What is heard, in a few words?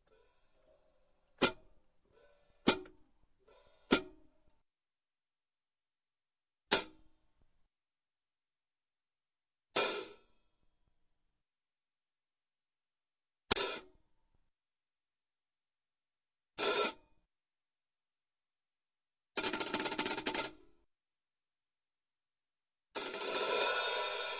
Hi Hat open & Close, three Times, Music; Percussion; Cymbal; Sound Ideas; Series 2000